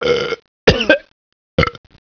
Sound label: CofCof